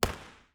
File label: archimage projectile